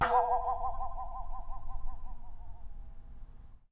Wobble, Japanese Pull Saw

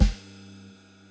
Acoustic Snare, Drums; BB 2HTC Samples Vol 4

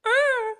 cute, creature

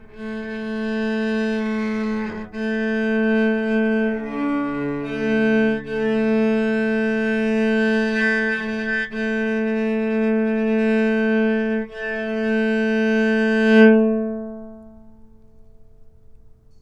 A3 - Sustain, novice cello

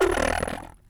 Monster Noise; BB Retail Therapy Sample Pack

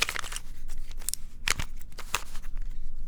Big Cube Twist, Rubik's Cube

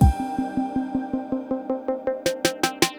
Pluck Buildup; Solos

